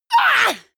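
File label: female combat attack short, screams; schreie; menschen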